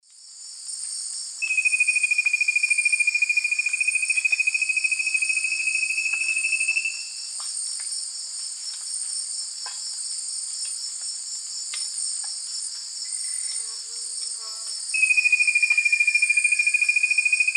Formicarius colma, song; S. America; Eastern Lowlands

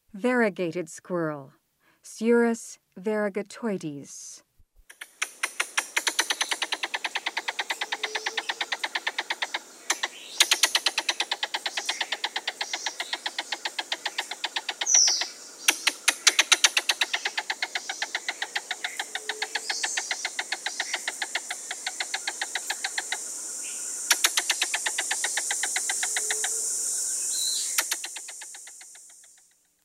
Variegated Squirrel; S. America

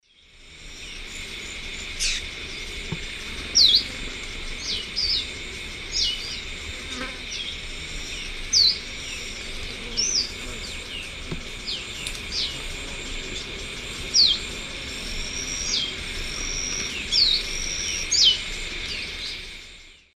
Sporophila castaneiventris, calls; S. America; Eastern Lowlands

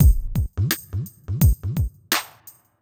lazy days 85

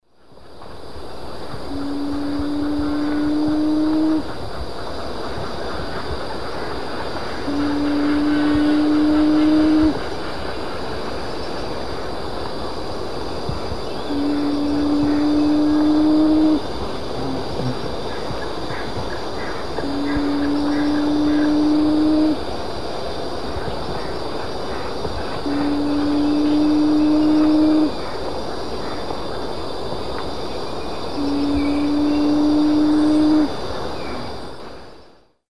Neomorphus geoffroyi, song; S. America; Eastern Lowlands

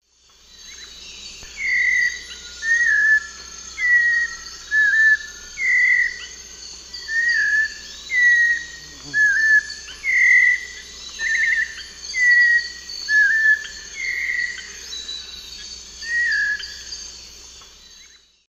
Turdus albicollis, song; S. America; Eastern Lowlands

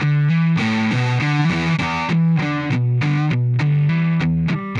Slow Slinky Riff, Rock, Guitars

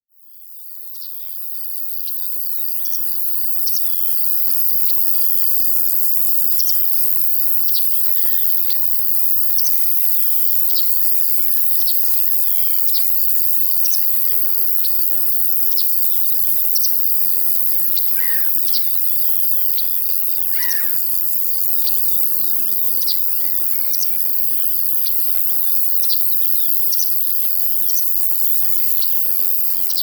Sounds from a Field Larks and Crickets; 24. Nature Sounds; Nature Chill Out 2014

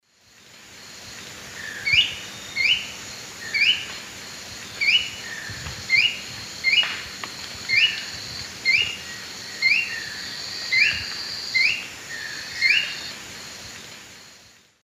Tyranneutes stolzmanni, call; S. America; Eastern Lowlands

